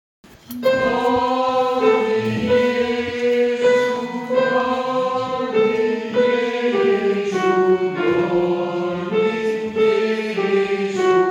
Tenor; Dormi Jesu

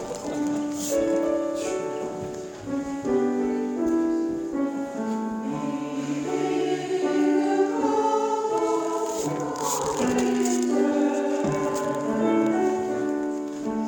Tutti

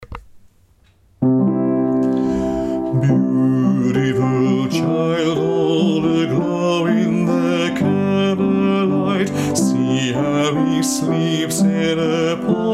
Born is the light bas

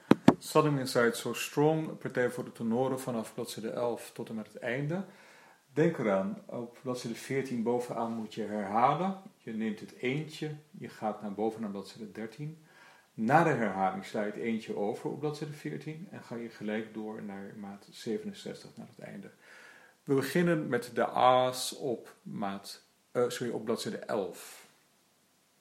Tenor

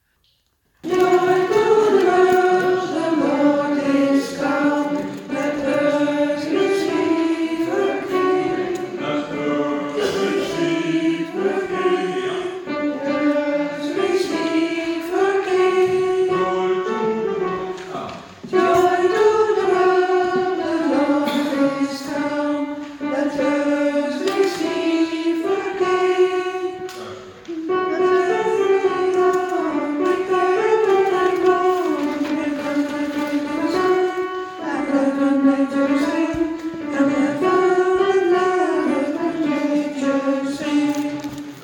Joy to the World Alt

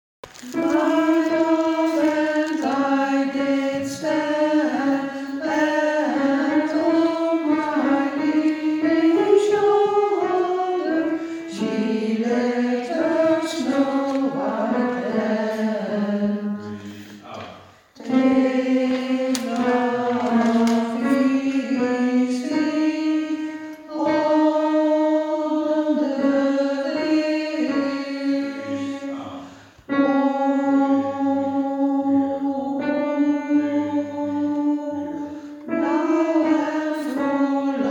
The Sally Gardens Alten

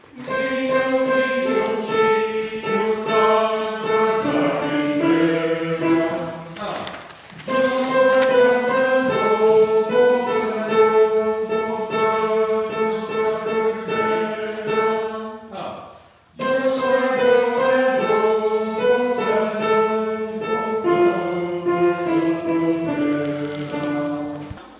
Riu Riu Chiu Tenor

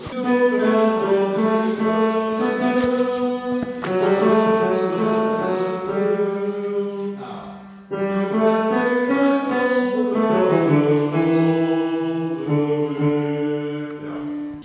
Bas

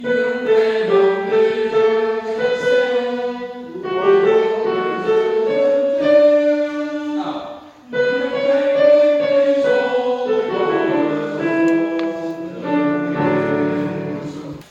Tenor

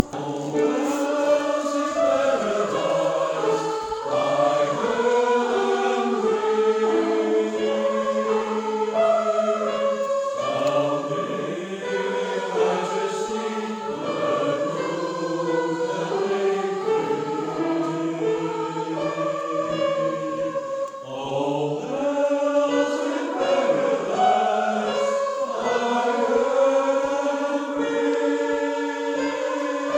Sop Heren Ah deel

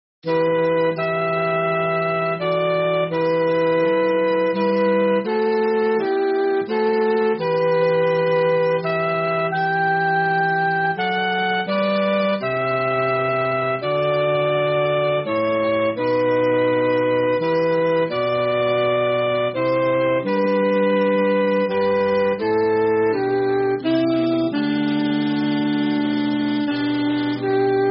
Soprano

